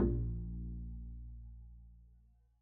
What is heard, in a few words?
pizz bb1, double bass